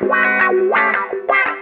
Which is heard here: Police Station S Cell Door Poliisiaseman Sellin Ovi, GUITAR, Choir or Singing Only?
GUITAR